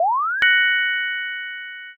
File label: light magic